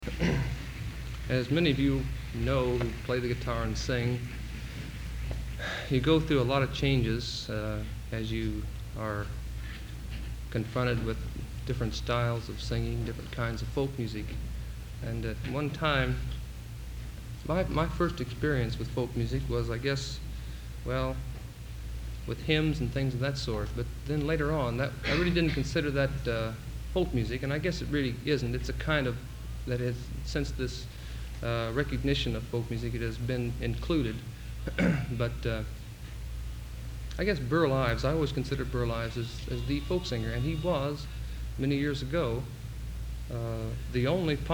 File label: Applause